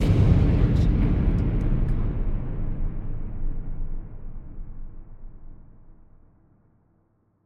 trailer hit distorted; soundscrate

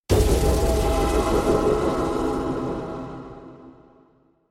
magical aura hit; soundscrate

